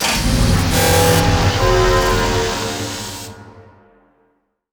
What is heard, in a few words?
archeology event energy field; ancient relics